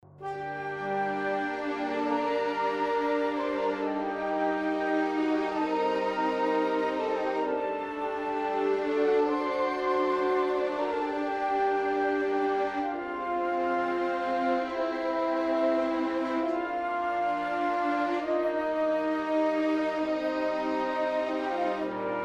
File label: ocean loader